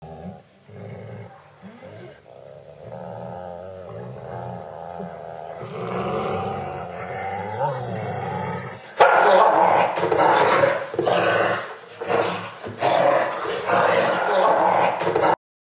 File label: dogs fighting over a bone